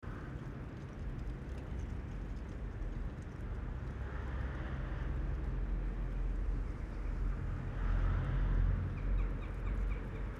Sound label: Town Parking Space Wind plays with leaves and gate; Aldi; Nordwalde; ORTF